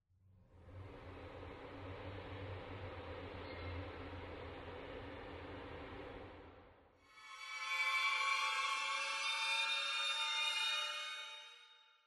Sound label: horror cluster